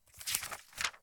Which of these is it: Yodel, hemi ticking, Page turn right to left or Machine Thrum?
Page turn right to left